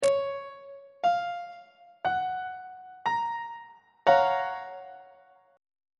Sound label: g flat major 7th 2nd inversion; on treble clef